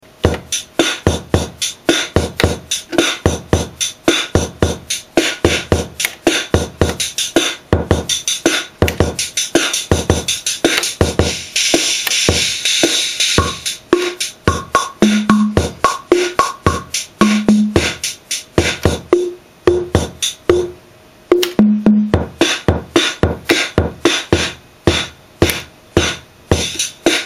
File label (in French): casiotone 403 boite a rythmes